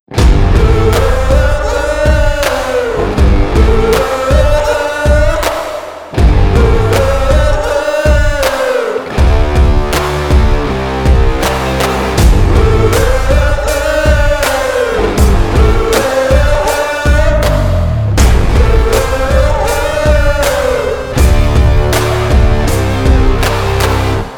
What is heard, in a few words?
Urban Guitar Dirty Blues